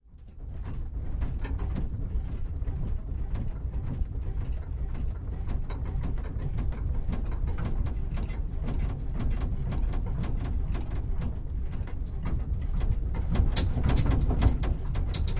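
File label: Metal Vibration Movement Dual, hits & rattles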